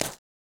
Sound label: jump, footsteps; project-thought-experiment